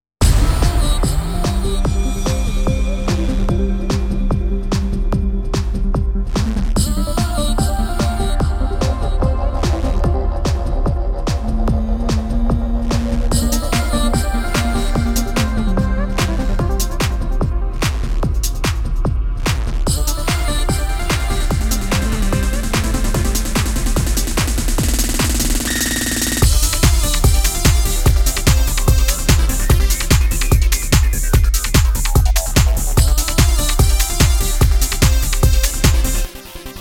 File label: Progressive Mix; the train 2007